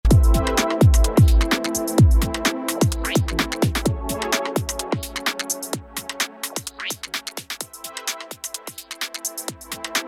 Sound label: Channel Send HPF